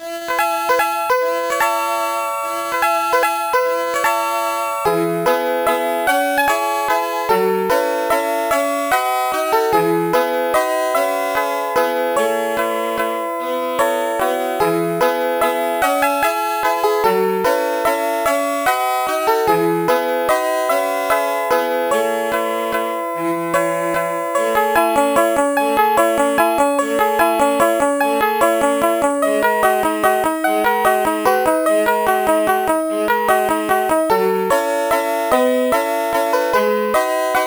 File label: rain; Sonix Music Driver